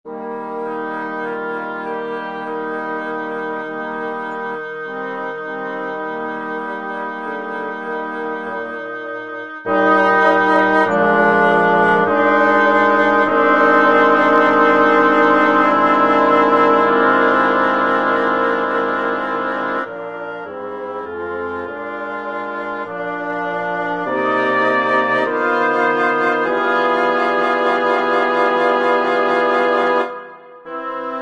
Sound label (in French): Extrait sonore généré sur MuseScore avec des timbres de bois; Durée totale : env 1min30; Pièce en attente de création